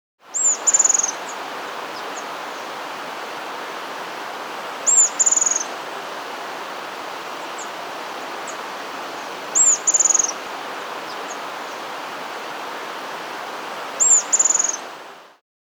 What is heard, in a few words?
Ashy-Headed Tyrannulet, Phyllomyias, Nature's Songs, S. America